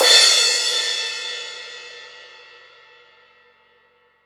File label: Crash Cymbal; drumthumper; oboe